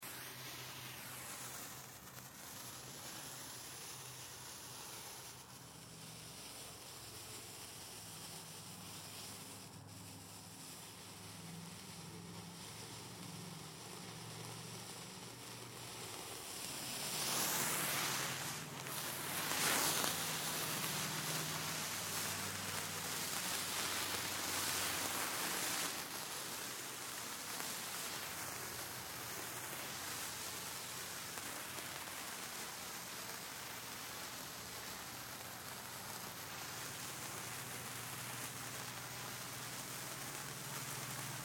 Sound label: Fire Sparklers Multiple Burn Bys Moves Various; Fourth of July